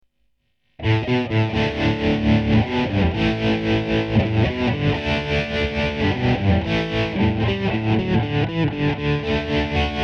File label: disto tremolo, guitare